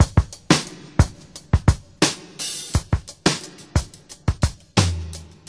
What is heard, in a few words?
87 bpm 00s Drum Beat D# Key, Free drum groove - kick tuned to the D# note. Loudest frequency: 1882Hz